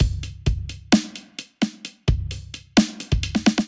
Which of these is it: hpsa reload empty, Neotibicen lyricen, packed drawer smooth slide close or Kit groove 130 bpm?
Kit groove 130 bpm